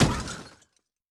Crush; Yamaha DTXpress-II

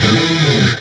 Heavy Dive; Proteus 2000; Digital Sound Factory Proteus 2000

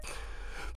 flute, D brass licks

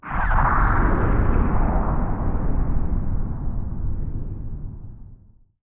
Blast, Explosions

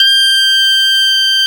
BP MID G3; USB Soundscan vol.42 - Celtic Flavours; AKAI

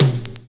TOM FILL

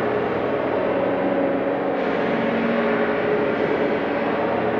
Cracklature, sparse soundscape

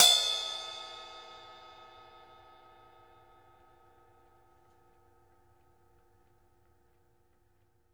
PING, CRASHES; Sampleheads; New York City Drumworks VOL-1; KD CRASHES

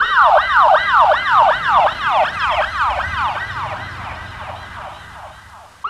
Cyber Siren; Electronic Point Blank

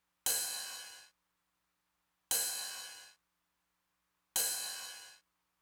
ride, Roland TR-909; Roland TR-909 Ableton Project

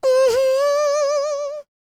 MMM, Vocal Blues Soul; Spectrasonics; Planet

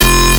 BASS SHOT

